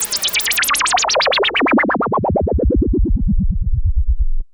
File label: Juno-60, Filtered Noise